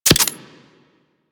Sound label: Pistol Projectile Attach; Weapons; Warframe; Tenno; Guandao